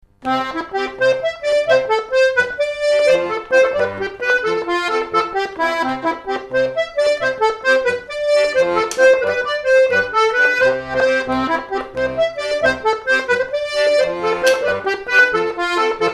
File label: Fandango; rencontres-2009